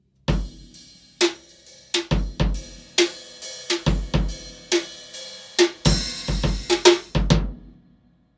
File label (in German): beyerdynamic tg d71c ganzes set 2m vor 18er bassdrum 8er snare